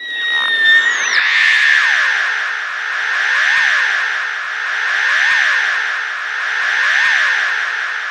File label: AQUA MODEM, AQUATONES; Spectrasonic Distorted Reality 1